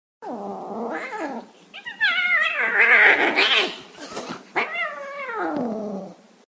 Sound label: Angry Cat